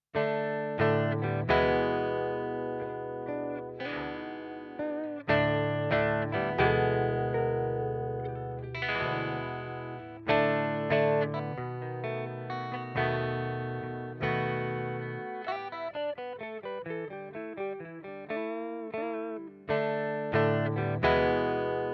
Spring Reverb Neunaber GFI Systems Specular Tempus